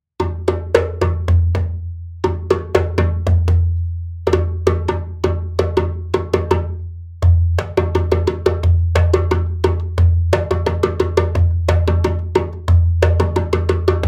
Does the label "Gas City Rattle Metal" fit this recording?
No